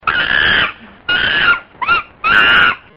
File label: Monkey Sounds Car Horn